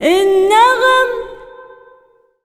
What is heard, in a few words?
SINGER, ArabianTraditions